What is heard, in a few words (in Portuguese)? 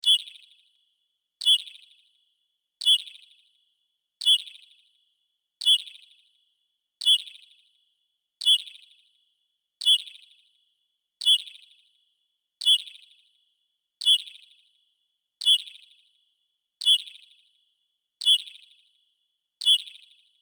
amostra de pulsos com periodicidade